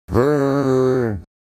zombie growl; reagan

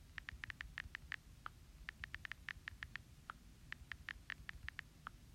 iphone typing

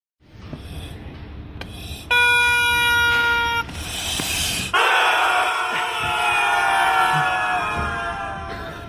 rubber goose

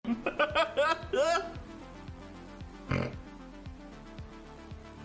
snort

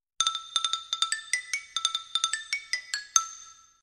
marimba funny

